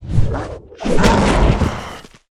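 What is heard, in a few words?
frog attack; monster; frogmk2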